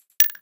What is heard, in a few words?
case, 5.56x45mm; Caliber